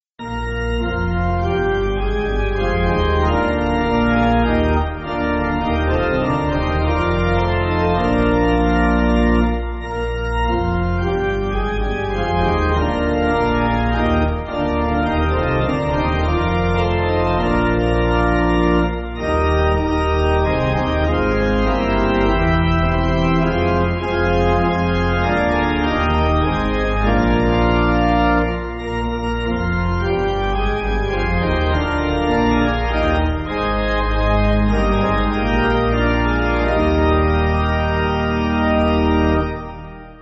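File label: Pipe Organ, Music Backing; Beneath The Cross; Gloria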